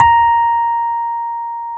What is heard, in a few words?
A#4, Electric Pianos; Wizoo